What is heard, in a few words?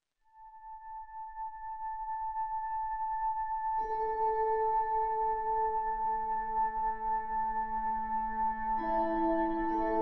Sad Calm Ambient Music